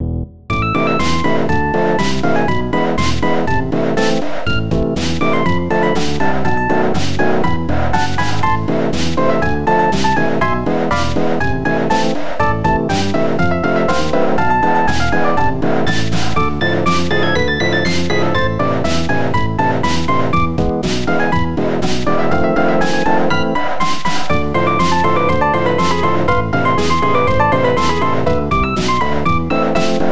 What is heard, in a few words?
The Piano